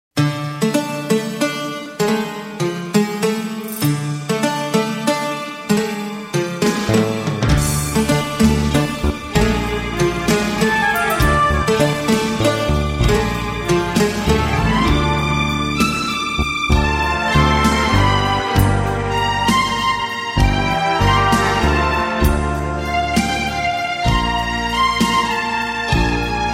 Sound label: Flute Saxophone